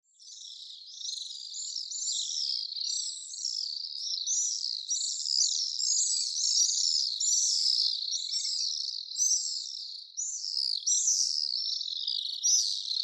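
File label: Pacific Swift; amatsubame; koe; osado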